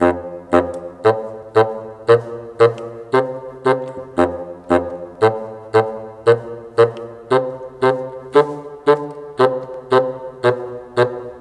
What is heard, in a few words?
Bassoon, Orchestral, Rock Pop; Sonic Foundry (Sony Creative Software); Orchestral Series VOL-4 - Rock and Pop